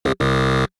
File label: Hurt; Night Hunter After Dark Chapter II